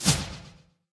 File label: Frog Chaser Jump